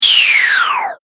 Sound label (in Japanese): 下降